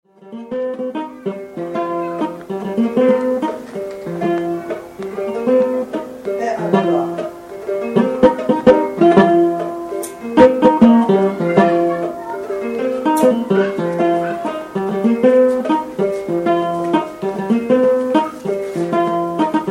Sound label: NGoni